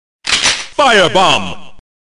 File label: Fire Bomb